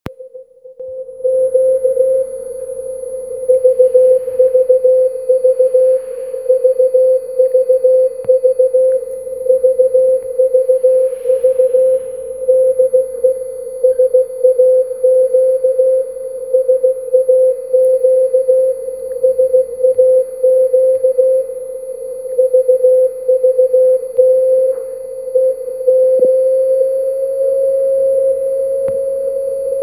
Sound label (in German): SAQ Aufwärmphase